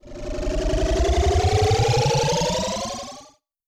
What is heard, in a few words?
Lift Off FX, 8-Bit; 8-Bit Kit Volume1